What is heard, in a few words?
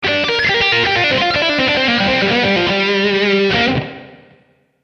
G29 98 blues lead